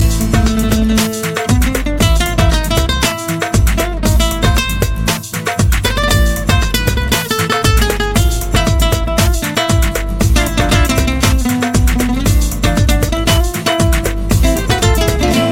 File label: Nice Tune